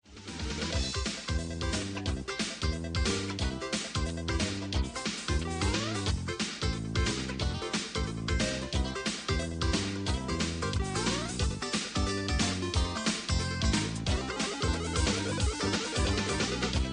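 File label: Slip and Slide Instrumental; Speedy Gondola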